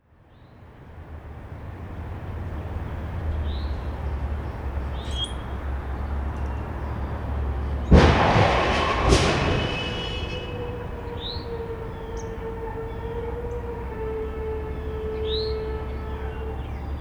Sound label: crash!